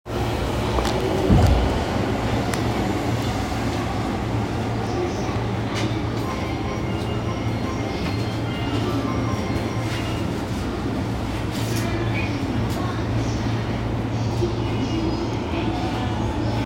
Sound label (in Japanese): 中央・総武線吉祥寺西, train sounds